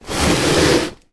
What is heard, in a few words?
foley cardboard box package slide on top of others; zapsplat